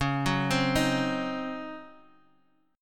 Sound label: Db Major 7th Suspended 2nd Suspended 4th, Guitar, Drop D; DbM7sus2sus4; arpeggiated; 11,9,10,8,x,x